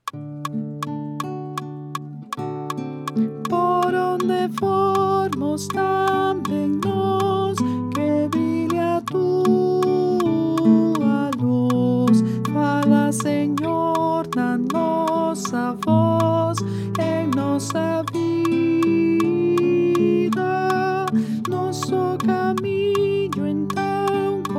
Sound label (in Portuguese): Vejam eu andei pelas vilas Contralto